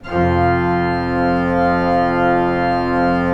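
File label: ORG F2, KEYS; Synclavier Sampler Library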